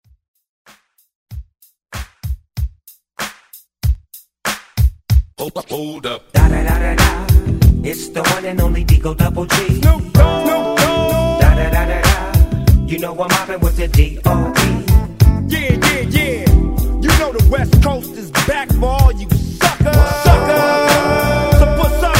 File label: Old School Hype; Redrum